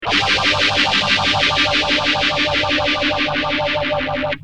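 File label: guitar, Nady phaser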